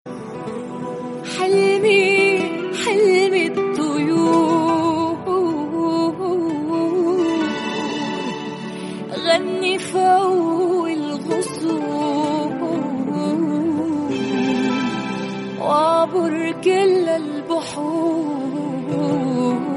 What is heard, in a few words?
Arabic Song